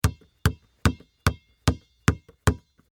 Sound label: Sports Basketball Dribble Bounce Short; SFX Bible 6